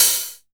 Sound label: hat, drum; Masterbits - Total Zone